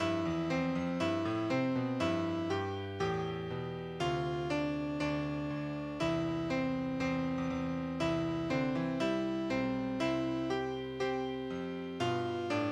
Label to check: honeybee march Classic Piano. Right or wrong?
Right